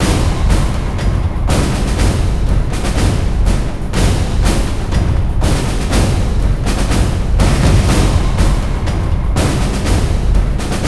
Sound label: action, music; Quake 4 Alpha